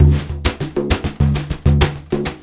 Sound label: 99 Frame Drum